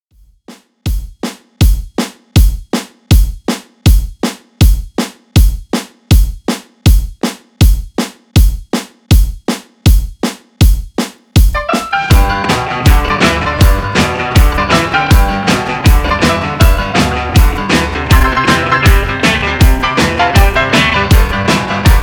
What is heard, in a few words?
168Bpm; Redrum; remixplanet